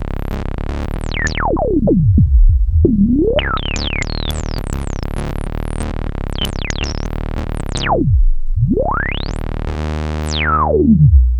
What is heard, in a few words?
VCF 24db LP